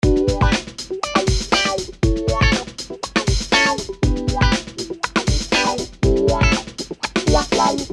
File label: guitar wahwah